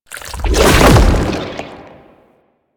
Proto Infested Babau Tentacle Flail Start, Enemies